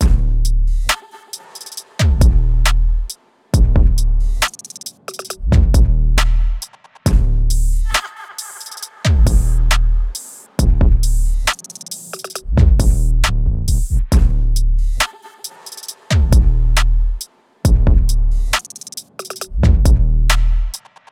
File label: Trap; Soundtrap